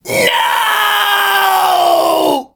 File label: nooo; serpentine